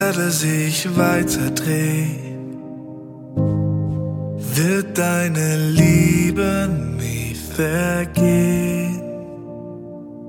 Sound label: Acoustic Version